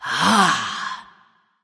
discover; smite; arachne

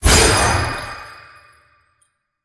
teleport ally; smite; god daji skin15

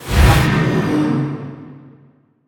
ghost excorsize; smite; zhongkui

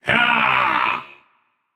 battlecry; smite; achilles

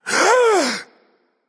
nv breath; smite; voicepack; xbalanque

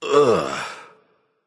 "ugh"; smite; merlin

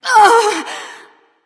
ouch; smite; neith

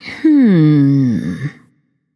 thinking; smite; nuwa

